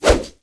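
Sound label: imp attack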